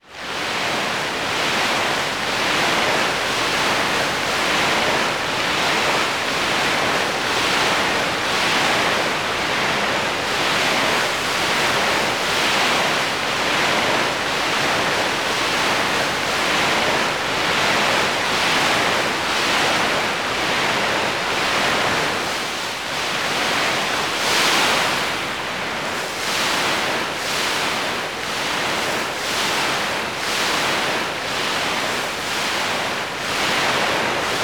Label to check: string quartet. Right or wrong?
Wrong